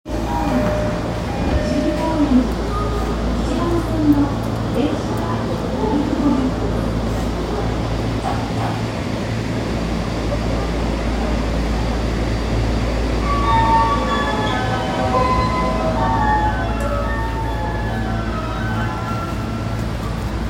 丸ノ内線新高円寺西

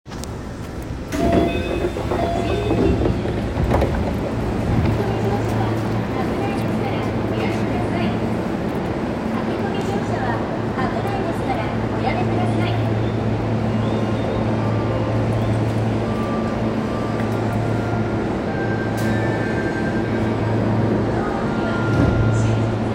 京王線聖蹟桜ヶ丘西接近, train sounds

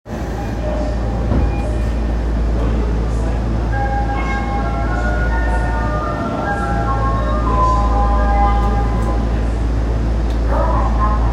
丸ノ内線東高円寺東, train sounds

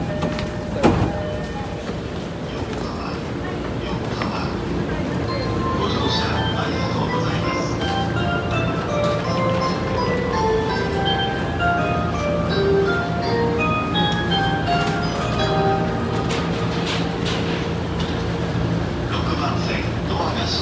JR 東海道線, train sounds

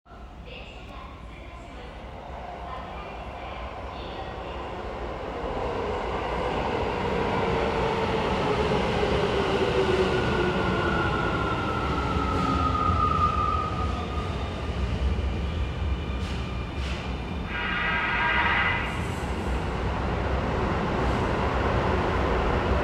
線柴崎東, train sounds; 京王

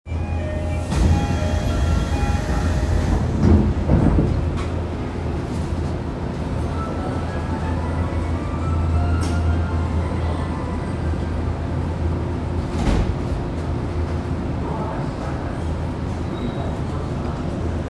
東西線妙典西, train sounds

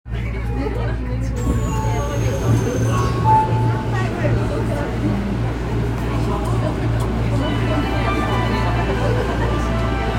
千代田線国会議事堂前東

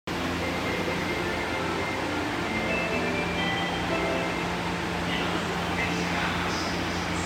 発車音, train sounds; 神戸新交通六甲ライナー北

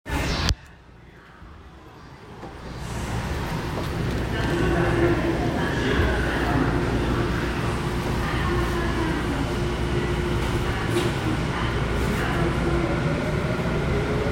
南北線溜池山王北, train sounds

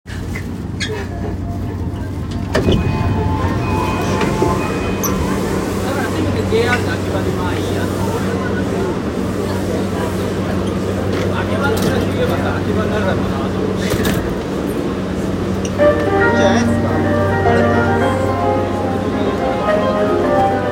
JR 山手線 秋葉原 CCW, train sounds

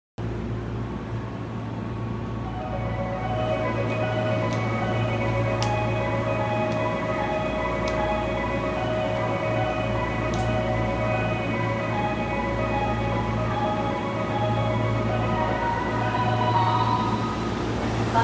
JR 常磐線 松戸 北, train sounds

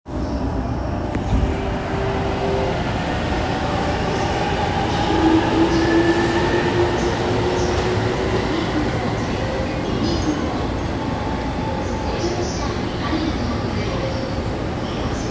中央・総武線荻窪西, train sounds

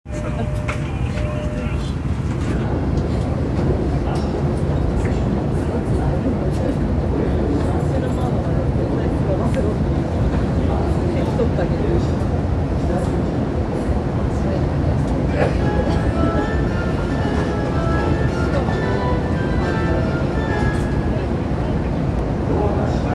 日比谷線六本木北, train sounds

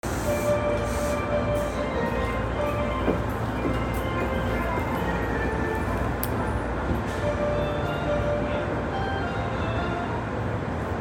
京浜東北線関内北, train sounds

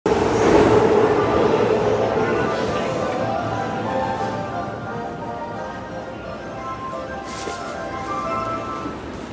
JR京浜東北線東京北, train sounds

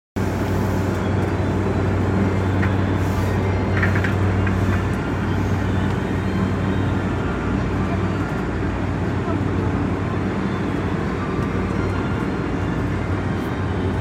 総武線 快速 馬喰町 西, train sounds

